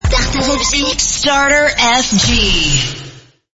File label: jingle; starterfg